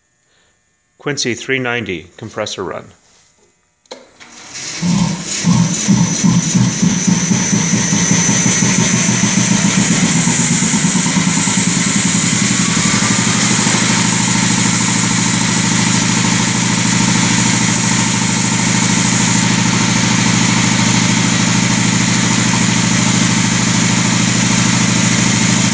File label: knocking